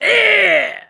hurt major, mechanic; voice

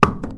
paintcan impact soft, metal; physics

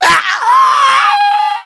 ignite, infected male; Left 4 Dead 2; npc

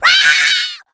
rage, infected, female

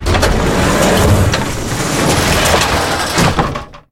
elevator close, doors

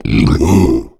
male zombie growl; boomer; attack

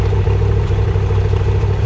apc idle loop

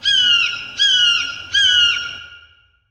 forest bird, ambient

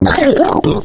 female boomer disrupt vomit; voice

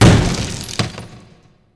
wood debris

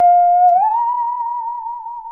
saw danger, music, zombat

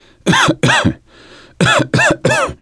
cough, survivor voice; gambler

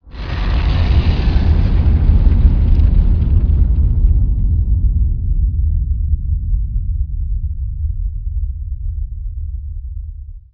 rumble, caves

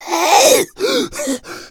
smoker lurk

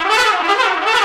trumpet danger

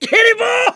scream while pounced, mechanic, survivor

